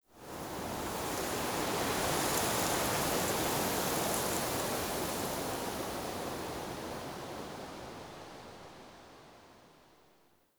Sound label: Wetland Forest Day Wind Gust Relaxed; Ambience